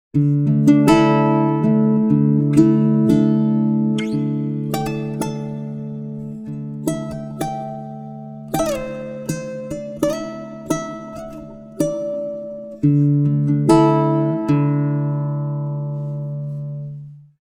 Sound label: guitar, pitchshift; prosoniq time factory dirac